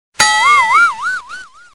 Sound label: Funny Incoming Sms